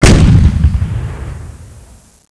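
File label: 105mm Cannon; Close Combat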